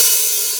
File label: Sizzly Open Hat Sample G Key, Royality free open hat one shot tuned to the G note.